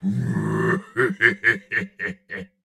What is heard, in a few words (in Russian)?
Смех; Турбосвин